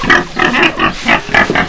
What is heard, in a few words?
big pig snort